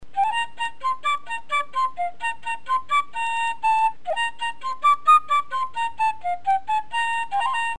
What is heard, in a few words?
fife